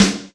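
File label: GATED snare drum, KICK + SNARE; Best Service Hyper Dance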